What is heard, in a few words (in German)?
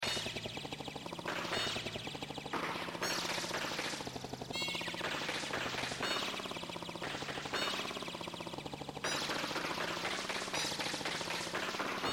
klingender bahnhofplatz kakophonie